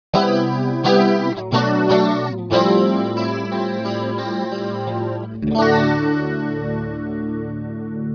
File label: Multi Chorus lush